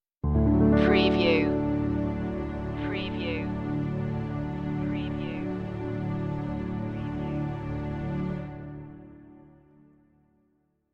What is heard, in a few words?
forgiveness stinger